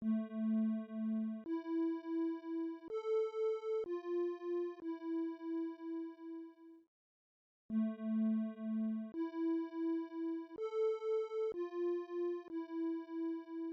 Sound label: aeolian shadow sustained, motifs; vault; voxel audio preview